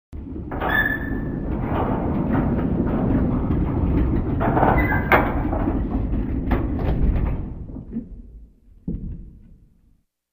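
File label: Castle Gate Mechanism, gates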